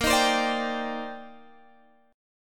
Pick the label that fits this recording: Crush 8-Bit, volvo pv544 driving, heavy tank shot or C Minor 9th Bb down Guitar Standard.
C Minor 9th Bb down Guitar Standard